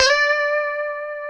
D 5, Guitars; Spectrasonics; Hans Zimmer Guitars VOL-1; 90's Sample CDs; COMP POP+VIB

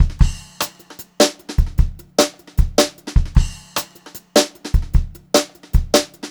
Brushed Drum Loops; USB Soundscan vol.39; AKAI

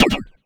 NOIZ PER, NOISE PERC; AKAI S-Series CD-ROM Sound Library VOL-5

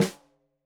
Snare Stereo, Drums; Deeply Sampled Drums; SMDrum

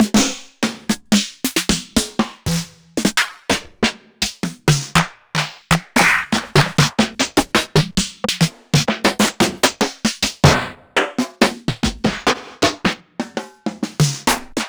Akai XR-20 Snare

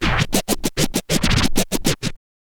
scratch kit, vinyl

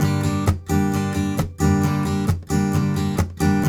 Blues Guitar Samples, RIFF; ILIO; Fretworks

